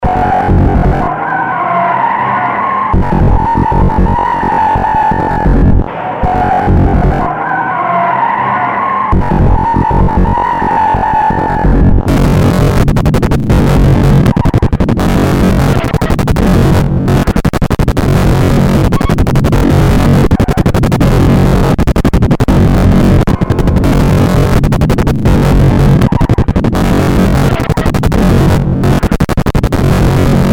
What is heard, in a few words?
CD player, Circuit Bent